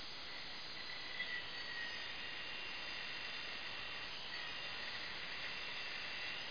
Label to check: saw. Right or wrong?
Right